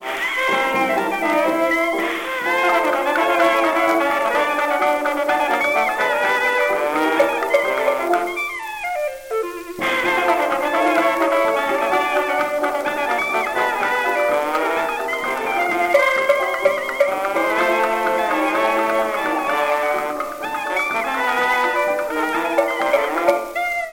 jukebox

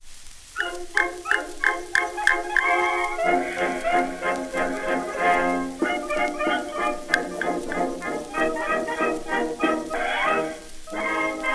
Chinese blues, jukebox blues

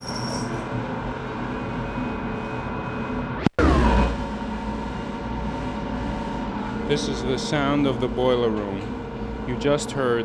Construction sounds

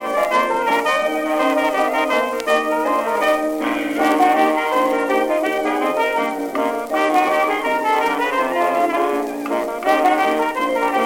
jukebox blues

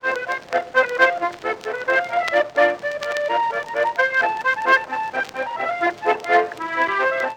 Polka Warszawska wiszniaczka Warsaw polka; loc-edison

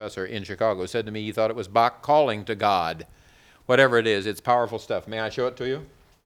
organ

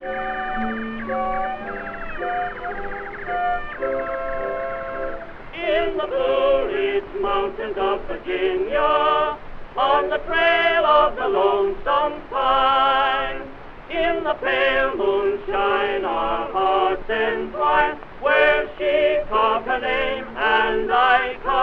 tenor and chorus with orchestra

